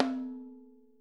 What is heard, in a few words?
ROTOTOMS; Sonic Reality - Vintage Timetraveler